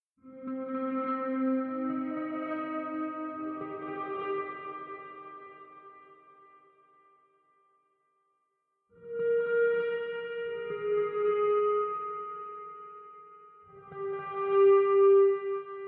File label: Forest Ambi